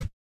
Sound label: heavy taunt chest hit